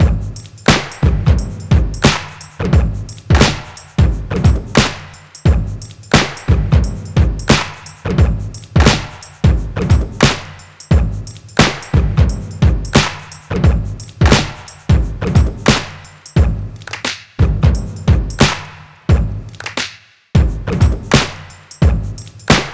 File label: 88 Hard Clappy Dustbin, Breaks; Lockdown Breaks